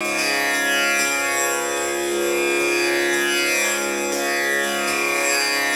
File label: TAMBOURAS; World Combo